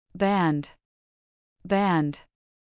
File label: band